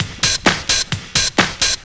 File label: claptrap, Deep House 130bpm